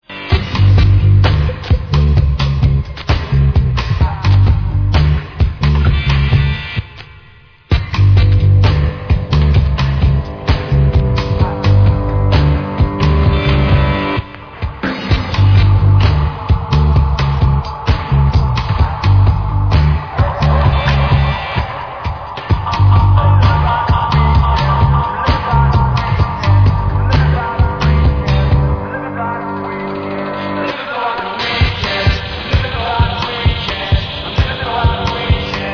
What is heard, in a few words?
rock